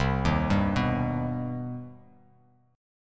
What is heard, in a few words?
C Minor Major 7th down, Bass Standard, chord; arpeggiated; JGuitar